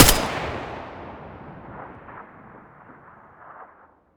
M4A1 muzzle mono

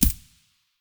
sig550 silencer far; weapons

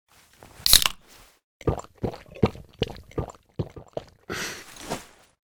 drink soda use; STALKER; IWP; item usage

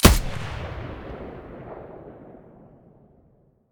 mdr muzzle stereo, weapons

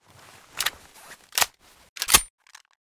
usp45 reload empty, weapons

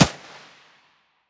muzzle mono distant

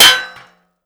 metall, bullet collide; STALKER; IWP

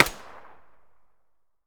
mp5 muzzle mono far, weapons

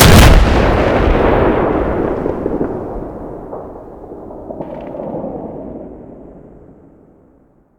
rpg7 explode

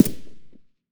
m4a1 silencer mono far, weapons

